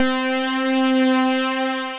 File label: Keys, Synth Keys; Vibrations by ModeAudio; Star Eyes Keys